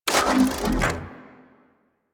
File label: Arch Gun Primary Beam End; Warframe; Archwing; Hildryn